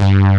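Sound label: synth A bass, JV 1080; Pro-Rec - Synthsonic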